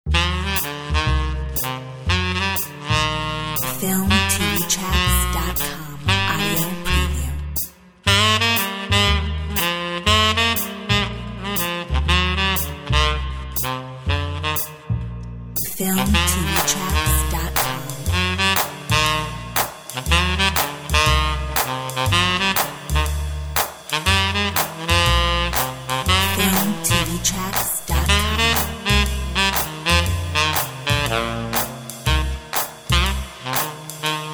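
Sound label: swing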